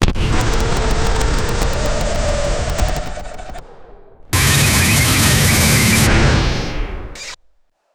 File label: air slash